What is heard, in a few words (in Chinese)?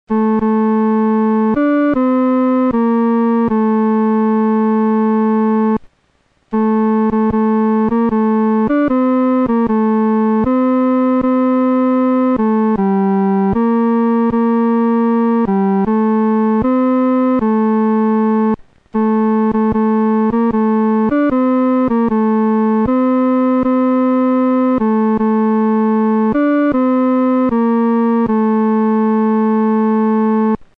独奏（第三声）